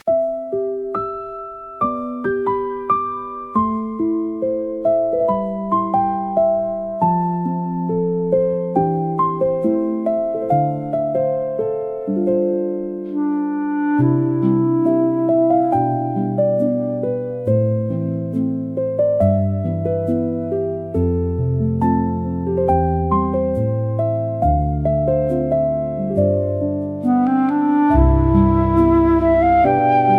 Soft Steps in the Garden, fantasy music nature forest; ghibli style; wind healing nostalgia